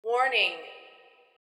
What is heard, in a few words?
warning